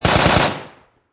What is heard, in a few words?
Machine Gun M4A2 Short Burst Close Perspective; Lynne Music